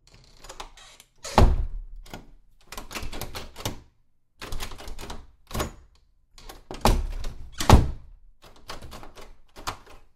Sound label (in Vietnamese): tiếng vân tay nắm khóa cửa